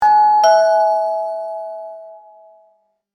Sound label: doorbell chime; basic doorbell chime